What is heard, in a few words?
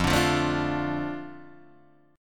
F 6th F down Guitar Open D